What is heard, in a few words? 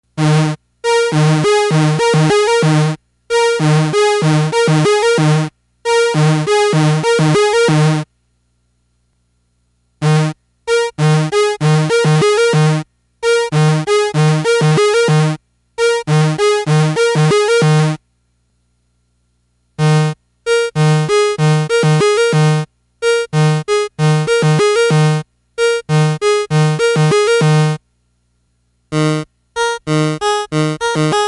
pwm switch with mod; Thomas Henry; SN-Voice